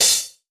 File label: crash